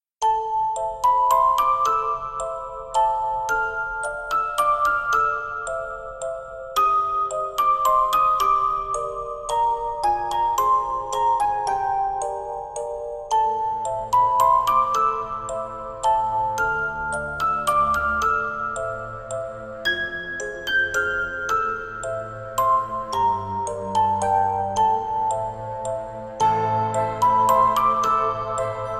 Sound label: Gothic Waltz Music